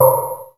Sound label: Snare, Korg Z1